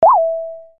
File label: pin